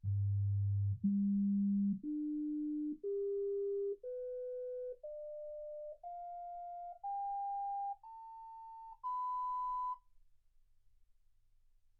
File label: sinus tones 48kHz